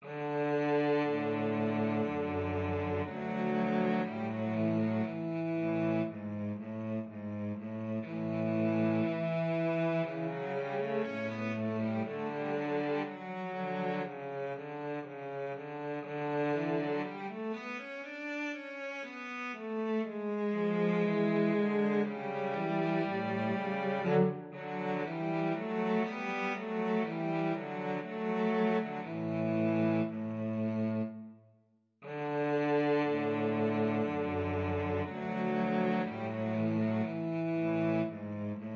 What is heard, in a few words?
VLC DUET, cello duet